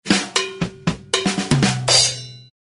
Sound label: accent crazy drum